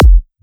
Kick, Psytrance; Drum Shots

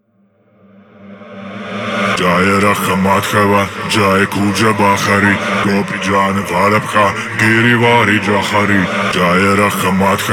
138 Shamanic Voice (Wet FX), Psytrance; Shamanic Voices

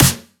Snare, Psytrance; One Shots; Claps Snares

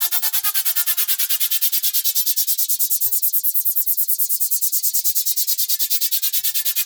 Noise FX; Singomakers; Infected Psytrance

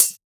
Hihat, Psytrance; One Shots

